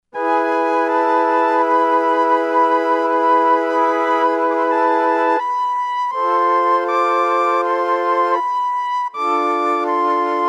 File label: Roland V-Synth Tape Flute; Factory Patches